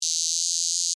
Cicada Buzz, Animal, Cicada, Buzz, Single, Vocalization, Insect, Bug; SoundEffects-BlastWave